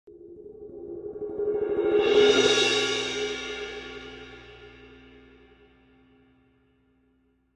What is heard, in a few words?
K Constantinople Suspended, cymbal